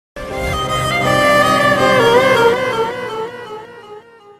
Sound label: Sms Ringtone